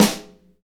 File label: SD Real, Roland; Sound & Vision - Gigapack I